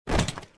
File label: hand; desann